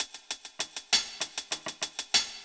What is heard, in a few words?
Break; The Stubblefield